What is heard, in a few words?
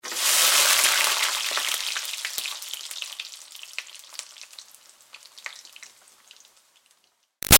Food Sizzle Oil French Fries Splash Hissy; Household; Big Room Sound